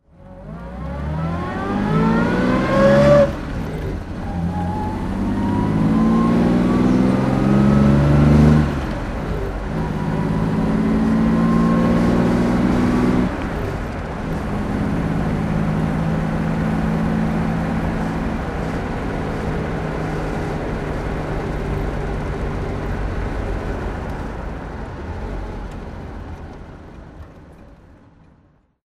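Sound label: jaguar xk140 dhc driving, Engine sound driving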